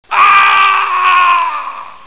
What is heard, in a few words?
scream